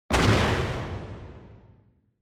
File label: Explosion Short